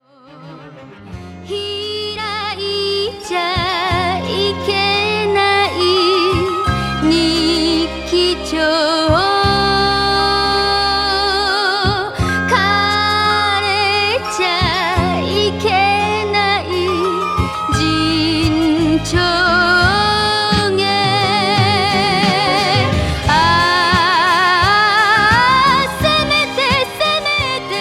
idol

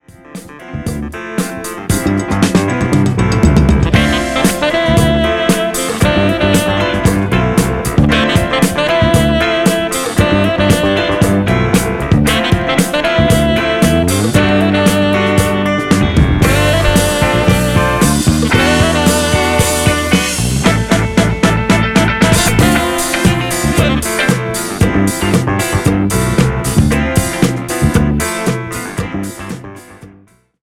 fusion

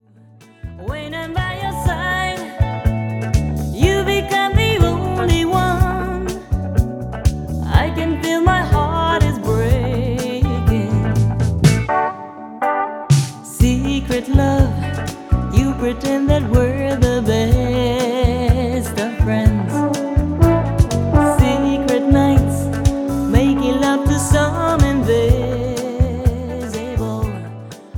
woman j pops

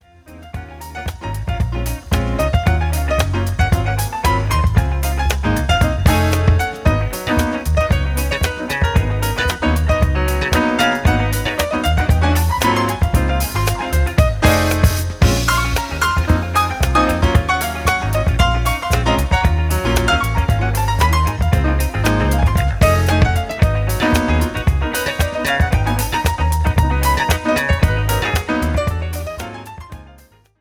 Fusion piano

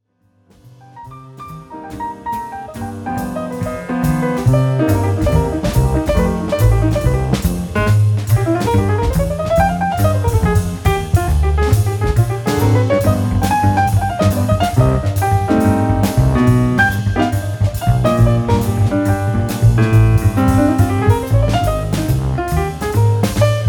jazz piano

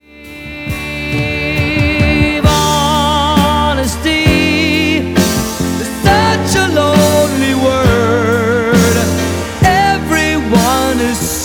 pops man

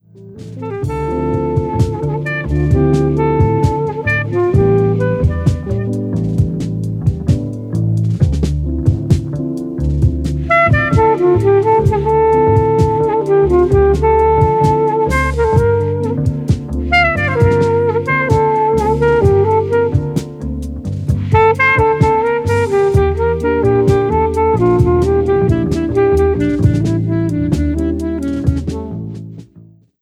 jazz sax

